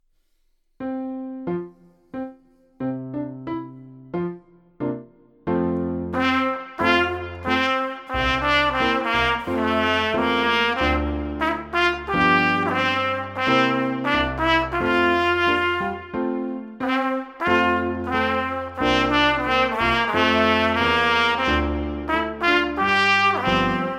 Trompetenfibel Marsch

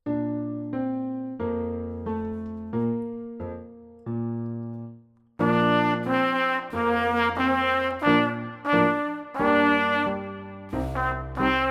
Trompetenfibel Amerikanisches Volkslied

